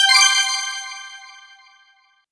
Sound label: CLUB COOL